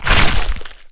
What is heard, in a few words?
Sludge Attack